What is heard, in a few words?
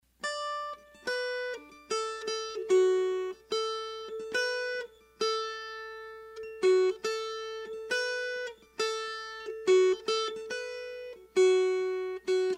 Melody